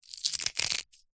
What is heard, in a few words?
egg shell already broken crush crack in hand; zapsplat